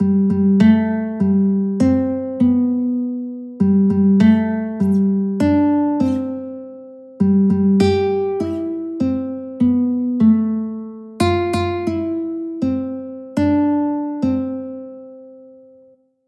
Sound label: happy birthday to you guitar audio